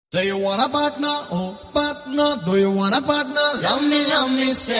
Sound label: msg tone ringtone